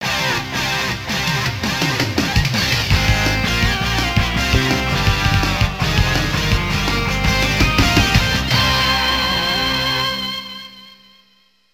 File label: Stage Clear; pulstar arcade